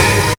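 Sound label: chord buzz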